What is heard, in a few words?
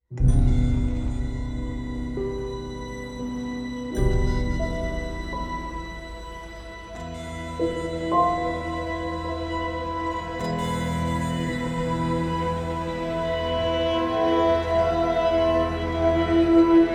Character Death; game rip; lovecrafts untold stories